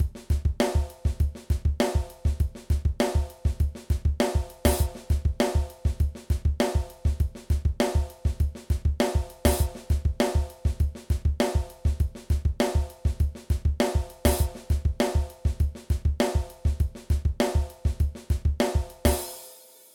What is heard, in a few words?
Groove